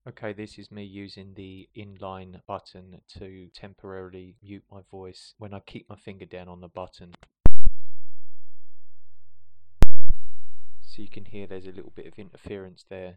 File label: button